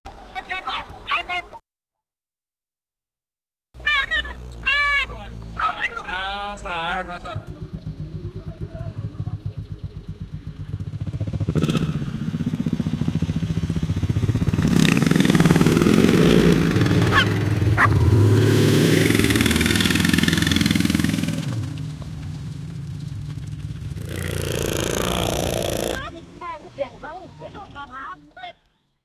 motorcycle motorcycle engi; SFX Engine

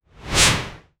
swoosh cinematic

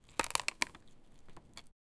interaction

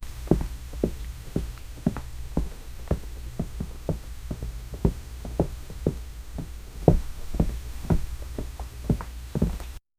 sound of feet standing

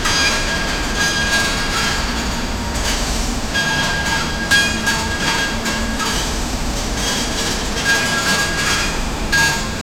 mechanical sounds of meta, factory

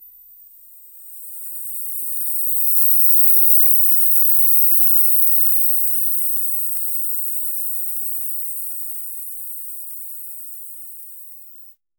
slime sounds, logo

